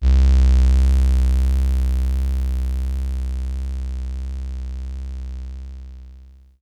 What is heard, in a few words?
SYNTH